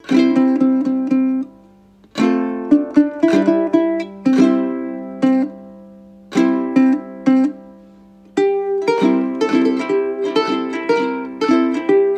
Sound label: Uke; Uke book